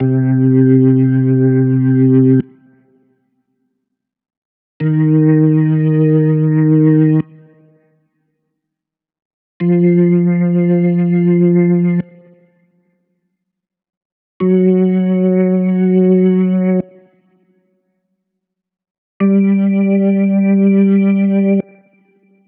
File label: Organ Cm Pentatonic